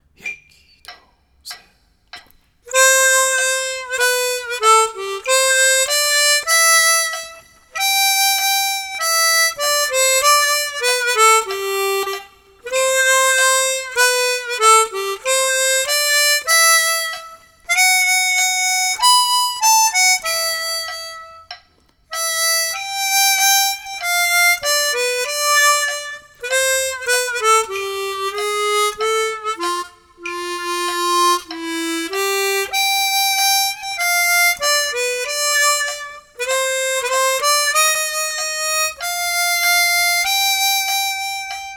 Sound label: Harmonica & Metronome